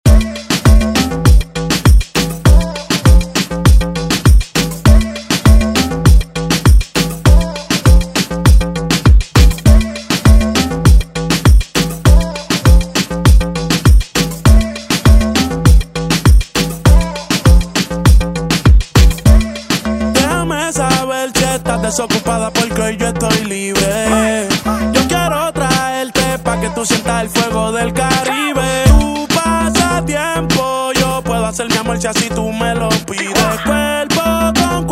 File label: Reggaeton; Redrum